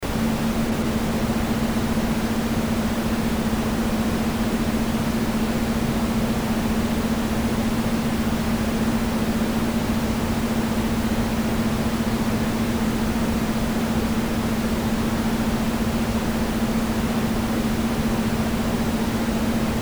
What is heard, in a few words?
Noise Sleep Induction